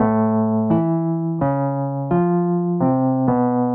jazz keys ElPiano 128 B-line n Riff A

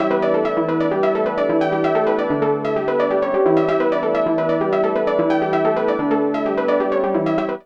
A# 130, SYNTH SEQ, Trance; GAMLN; AMG; Global Trance Mission VOL-2

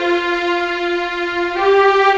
Viola, Violins, Orchestral, Rock Pop; Sonic Foundry (Sony Creative Software); Orchestral Series VOL-4 - Rock and Pop

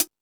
Stadium Rox, Drum Machines, E-MU Pro-Cussion

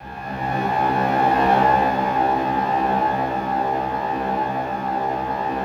SLOW STRINGS; Music Store; 90's Sample CDs; Yamaha VP-1; SLOWSTRINGS4

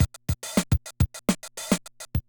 Loop, classic machines, rpm 40

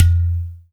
UDU; Spectrasonics; Heart of Africa

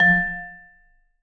V SHRT MF F2, Marimba & Vibes; Sampleheads; Dave Samuels

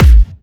Electro Kicks; Bassdrums; Vengeance - Essential Clubsounds Vol.5

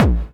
BD, Dance Mega Drums; Best Service

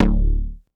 Tumpa Bass; Big Fish Audio; Old Skool House; OSH Bass

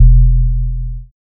SUFFOCATE, Bass Analog & Synth; USB Soundscan vol.12; AKAI

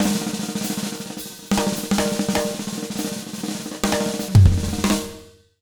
100 B BEAT, Jazz Latin Drumloops

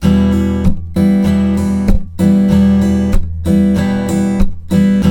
96, Blues Guitar RIFF; ILIO; Fretworks

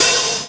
hat open high hiss, Chicago House Drum Hits

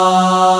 vox F#2; E-MU Formula 4000 Series Vol. 5; Protozoa; Proteus1 Presets

